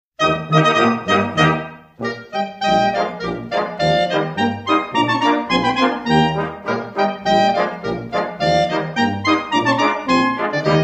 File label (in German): Schottisch